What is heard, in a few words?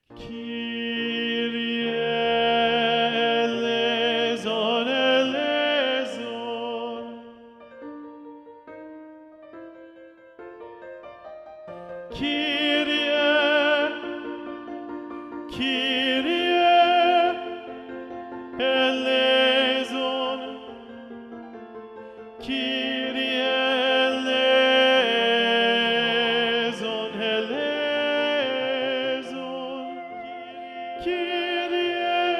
Kyrie - Tenor Predominant; Haydn; Little Organ Mass; Choral Society of Greensboro